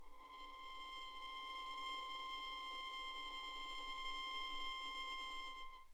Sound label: violin